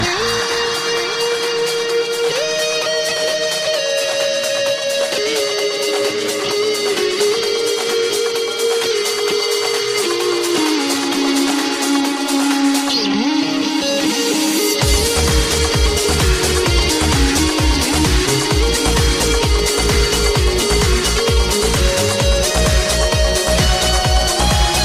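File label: guitar music ringtone; gitarnaya muzyka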